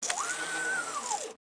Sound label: CLEAN, industrial; zones